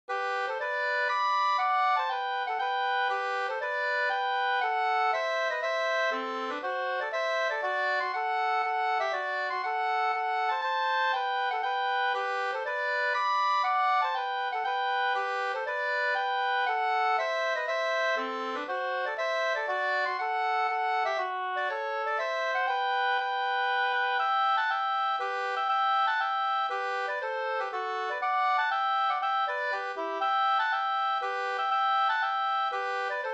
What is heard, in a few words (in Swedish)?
mazurka; e Lovisa Olsson Asarna Jm 2st